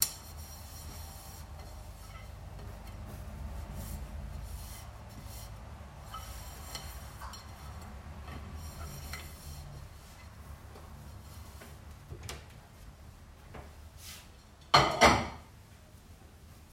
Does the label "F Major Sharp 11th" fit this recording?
No